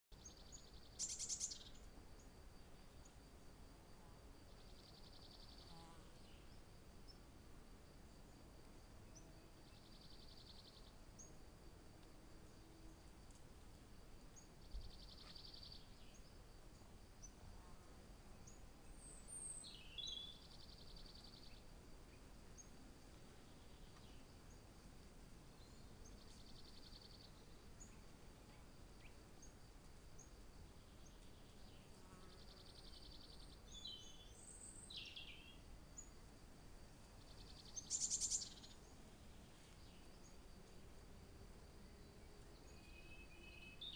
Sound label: ambiance; seeding-planets